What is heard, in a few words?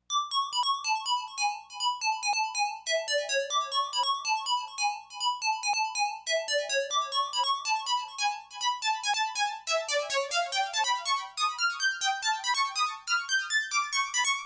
Cross Mod